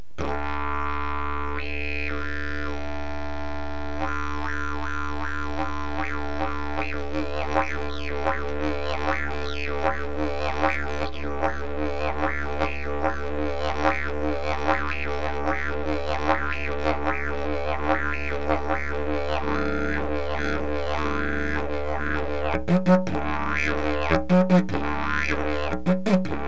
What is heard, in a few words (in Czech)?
eukalypt E6, didgeridoo